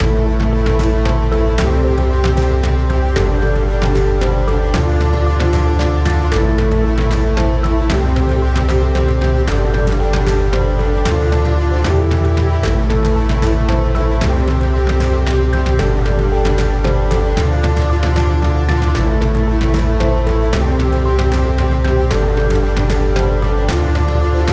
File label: Synth Fantasy; Synth Fantasy Music Pack